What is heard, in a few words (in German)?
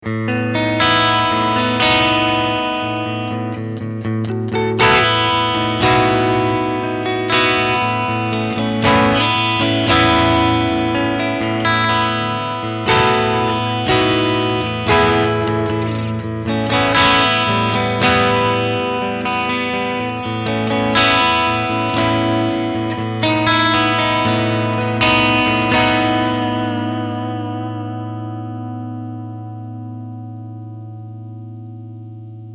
Steg SC Clean Picking